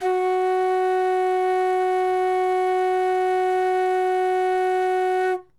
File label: Wodden Flute Gb2